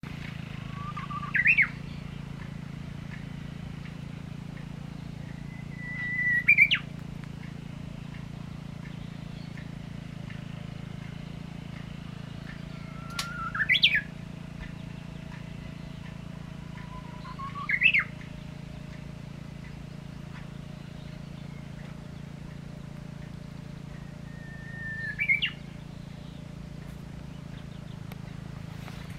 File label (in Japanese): uguisu song